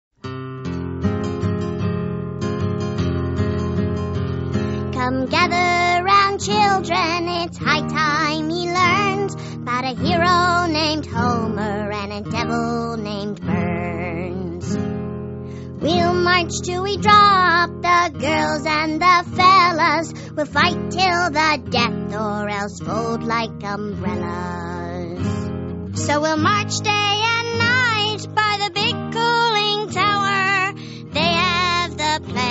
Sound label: union strike folk song